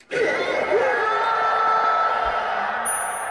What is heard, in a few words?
Jig, Nokia 6020 ringtone